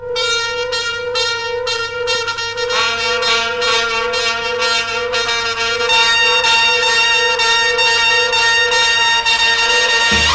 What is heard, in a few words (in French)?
JAZZ ET JAZZ